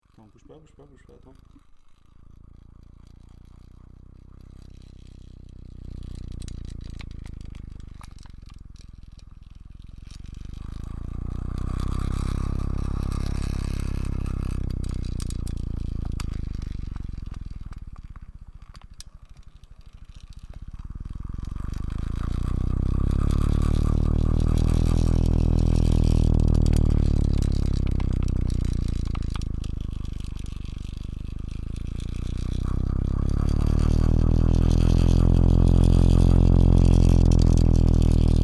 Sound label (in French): Grognement